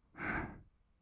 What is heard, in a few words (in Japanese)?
ブレス 吐く音, kawaii voice; ASMR; 1200 kawaii voice!!